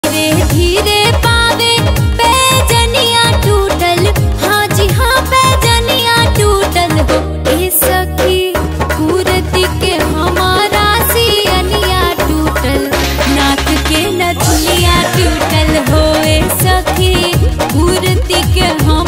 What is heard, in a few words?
bhojpuri ringtone